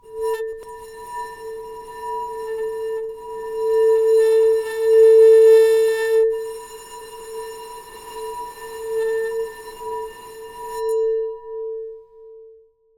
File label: Bowed, Large Wine Glass